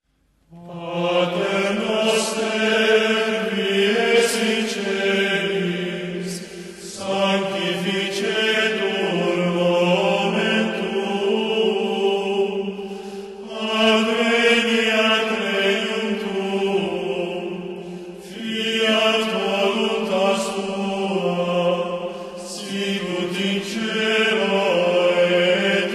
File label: Pater Noster Our Father in Latin, hymns